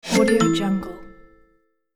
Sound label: kids adventure discard